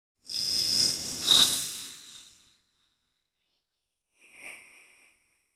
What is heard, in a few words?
anime appearing with smoke